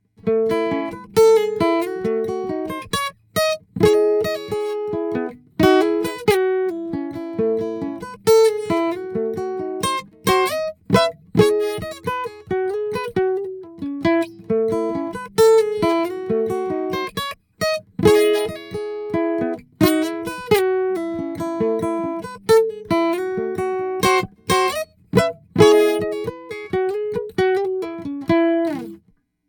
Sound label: Classical Guitar, Neumann M147